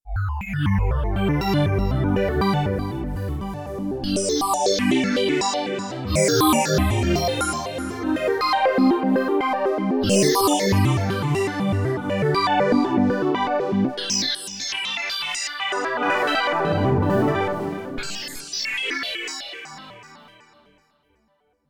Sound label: Korg Radias-R